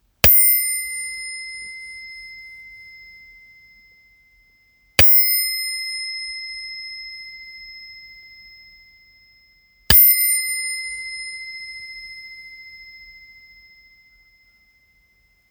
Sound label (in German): Spacer-Glocke